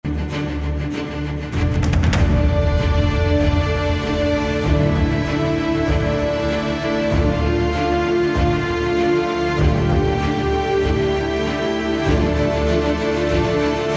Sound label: Action 97BPM; mixology vol 1